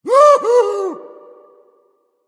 surprised, voice; Smite; ymir skin6

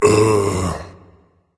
seriously berserker; cuchulainn

